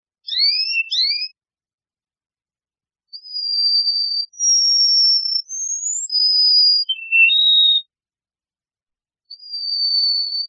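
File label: voice of canary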